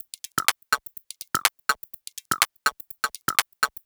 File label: Top 124bpm, House Drumloops; 90 s Sample CDs; Zero-G House Fabrik Drumloops; ZG Drumloops